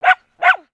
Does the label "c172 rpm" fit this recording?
No